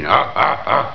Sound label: hahaha